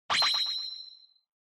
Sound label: Onoma Flash Low Short